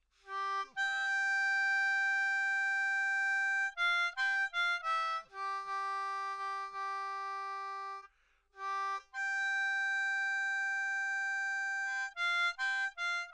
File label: 10 Hole Tarzan Call, Harmonica